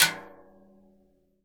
CASCARA, Synclavier Percussion, World & Orchestral; ILIO; Synclavier Percussion 2